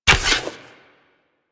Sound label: Staff Equip A; Warframe